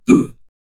russian man grunt; impact sounds

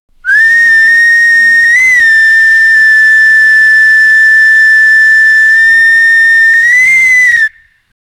steamboat whistle

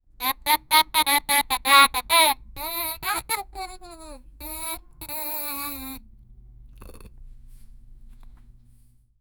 playing with a fabid doll

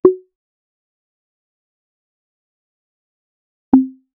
defeat, pixel

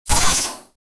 generate a short 1 second, robots

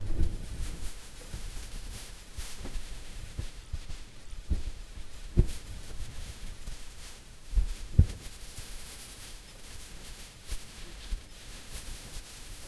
fabric rustling as hijab

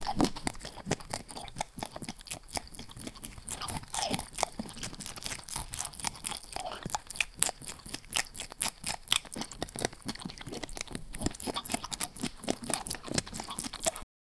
a panda eating a hamburge

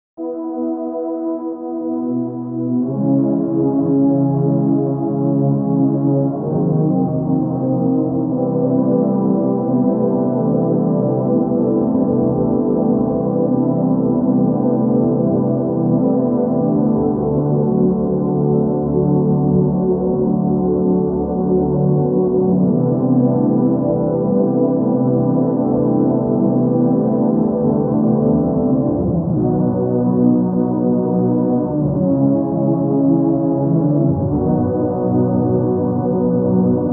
cheerful

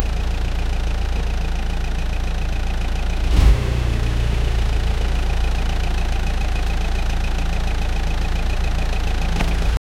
car starting sound after; starter

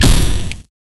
soft lightning strike sou, cartoony

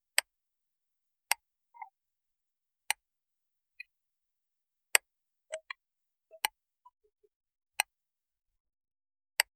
chunky satisfying button

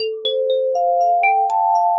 loopable ringtone cute

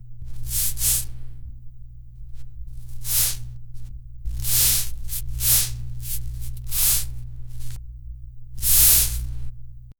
rubbing the eye

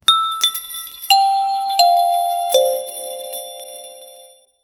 a cheerful bell tone, musical

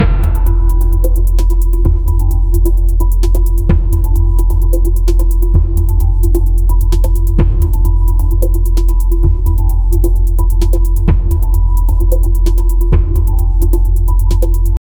sparse electronic beats

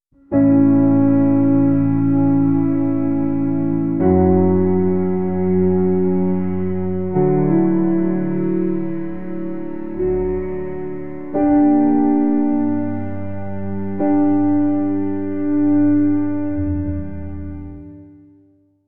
melancholic cloudy day mu, classical

